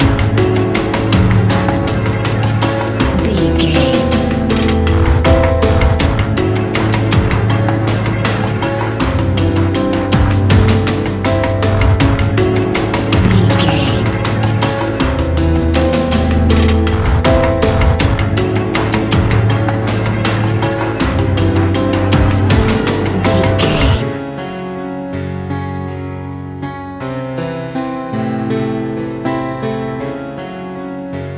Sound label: modern horror